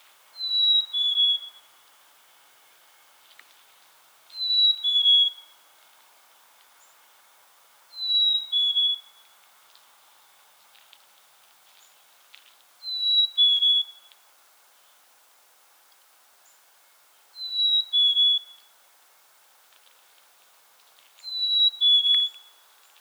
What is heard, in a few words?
Black-Capped Chickadee